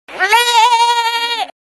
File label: bleating; Bambi